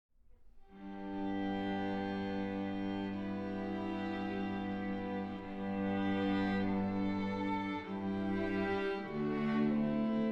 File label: without Ukulele